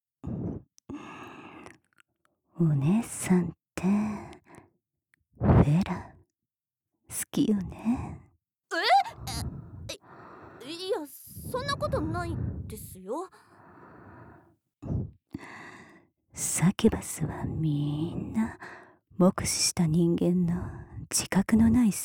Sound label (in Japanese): フェラさせ (吐息、シコシコ指示、乳首オナニー); しっぽによるポルチオ開発; テレパシー有; SEあり(射精音なし)